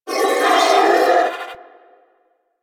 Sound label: Sentient Pain, Barks; Enemies; Symbilyst